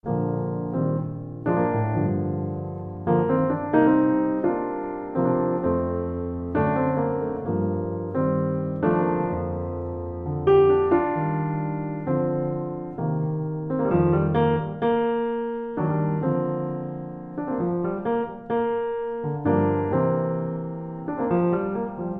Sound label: Solo Piano